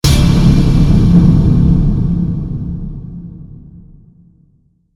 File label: shock; easyrpg; WitchsHeart